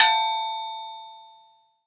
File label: Cowbell; 7rystan's 600+ FREE EDM SAMPLES & DRUMS; Percussion